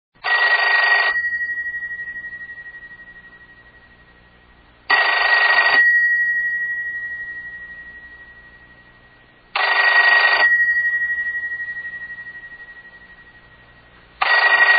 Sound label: telephone ringing; sound effects and sound reinforcement